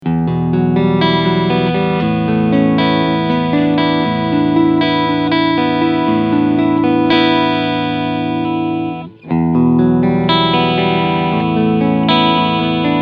PRS McCarty 594 E Gitarre Cleansounds SC Mode Position 1 3

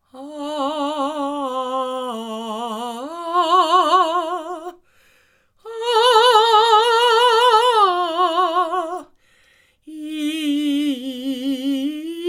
Mit Reflexion Filter

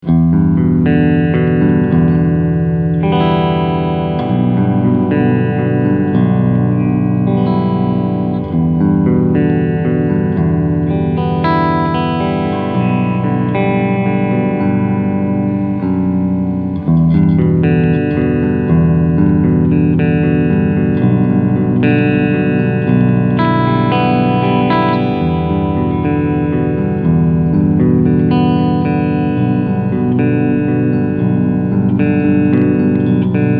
Cleanpicking beide PUs

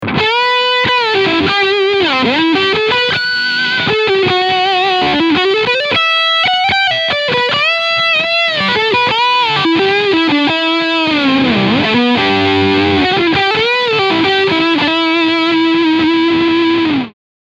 Steg High Gain Lead

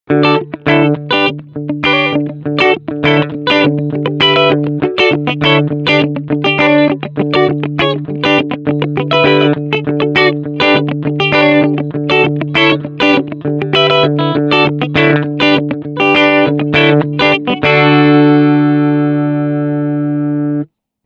Clean Riff Steg Pickup

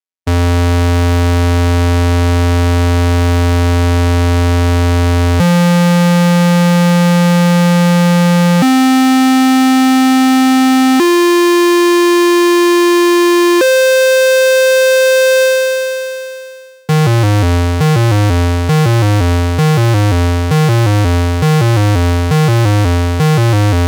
Rechteck

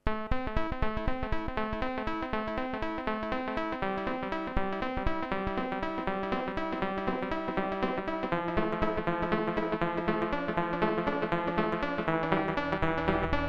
Korg Trident Sequenz stringbass

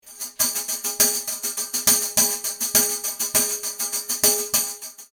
AKG C12 Tambourin 200cm

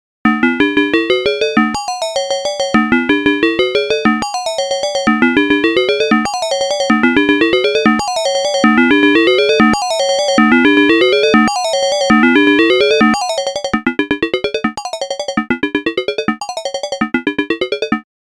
zwei Blacet VCOs lineare FM C Dur

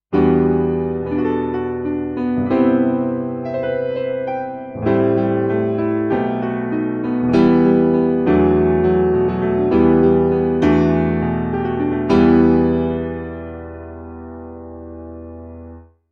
Grand Piano 3 ohne Para EQ

